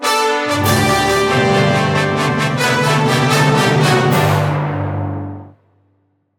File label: Fanfare BPM98 G; 4/4; Brass + Percussion